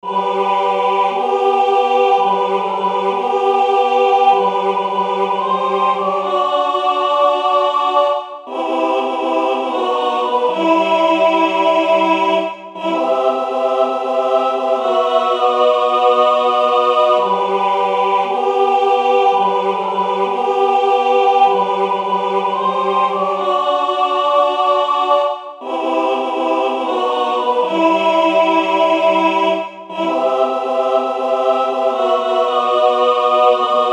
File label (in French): tutti